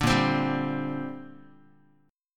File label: Bb 6th Add 9th Bb Guitar; Bb6add9 strummed